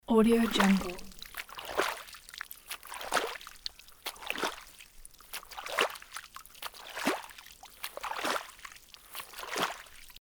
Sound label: moving rowing muddy water; fxprosound